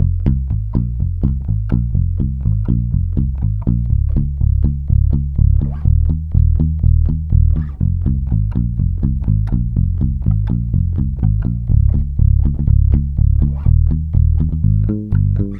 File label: Bass loop Arppy 123 Fm; Electric Bass Loops; Slate Digital BASSHOLE Sample Pack